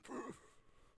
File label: Inhale; Civ4 warlords